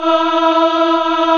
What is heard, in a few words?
choir